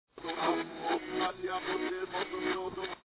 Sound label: reverse